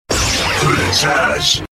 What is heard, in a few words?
Full Charge